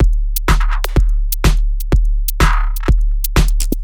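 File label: Drum loop 125 808 shooter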